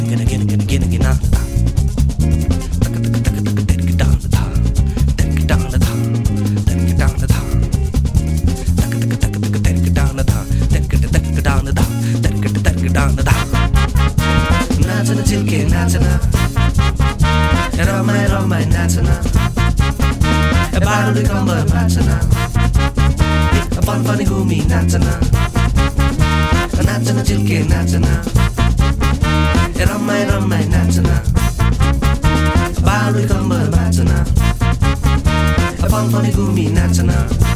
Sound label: Hip Break